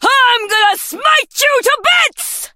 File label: hurt; bibi thor skin